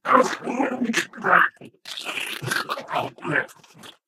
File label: ballbot crushed